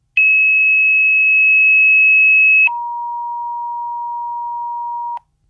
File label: Fire Tone